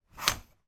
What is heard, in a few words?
household curtains on metal rail short movement; zapsplat